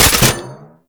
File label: Archgun Low; Warframe; Weapons; Archwing; Tn Nokko Archgun